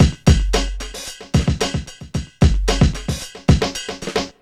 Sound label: RIDE 112, Funky Elements loops; Zero-G